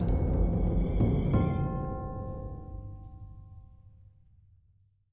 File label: Stinger Death; Adam's Venture Origins